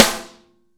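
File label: snare ambient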